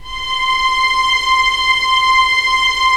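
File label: STR SC, strings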